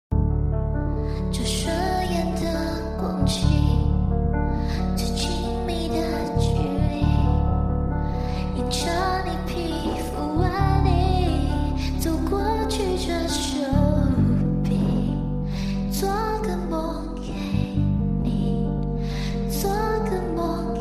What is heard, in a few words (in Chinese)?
偏萝莉唱歌